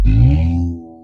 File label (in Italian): Gargoyle Di Cristallo; BLIND QUEST - The Enchanted Castle